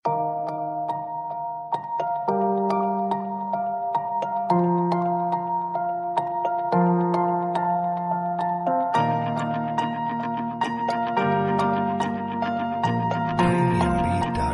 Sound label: bgm